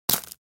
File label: High heels single step on broken glass